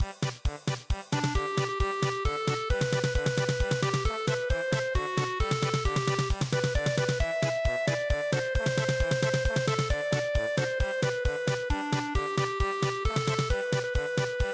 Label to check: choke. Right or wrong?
Wrong